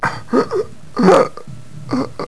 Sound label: pain, player